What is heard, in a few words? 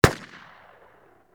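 firecracker outside explosion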